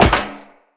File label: Wall Jump Metal Perforated, Footsteps; Wall Run; Characters; Tenno